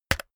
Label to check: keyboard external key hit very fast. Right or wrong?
Right